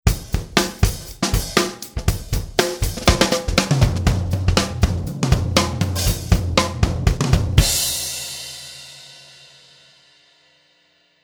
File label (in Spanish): bateria